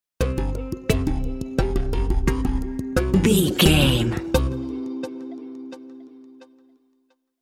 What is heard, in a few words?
plucky curiosity sting